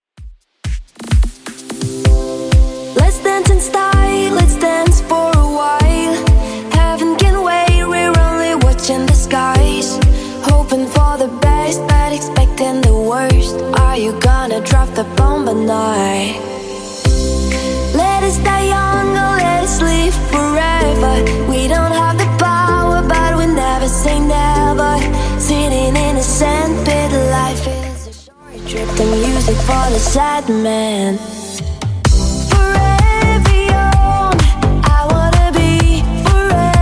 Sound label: Bass House Redrum 128bpm